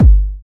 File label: dry kick g key